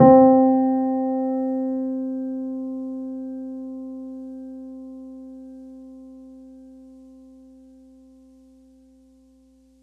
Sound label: Upright Piano C3; Piano & Keys